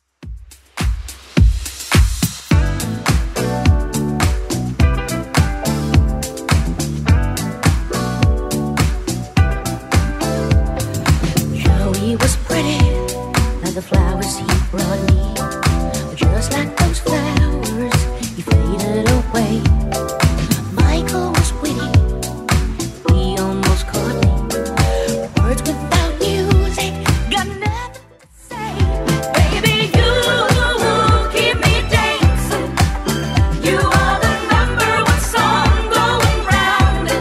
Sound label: 80s club 105 bpm clean